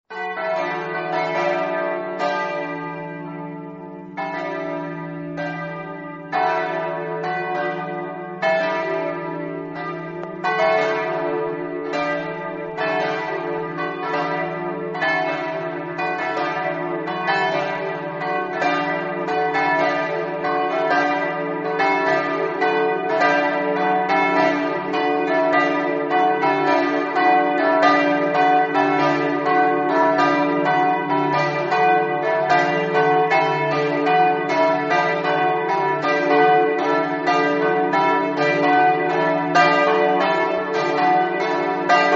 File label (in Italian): campane; maniago